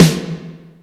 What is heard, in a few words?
solid snare single hit E key, Royality free snare drum sound tuned to the E note; snare drum samples